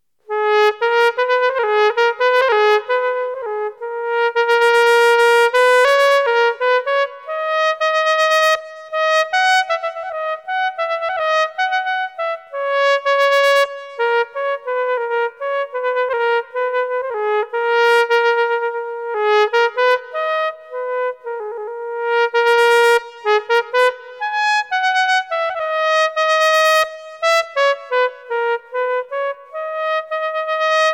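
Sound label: Tpt trumpet; Cls. Trumpet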